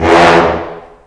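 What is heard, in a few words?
FALL, brass; AKAI S-Series CD-ROM Sound Library VOL-2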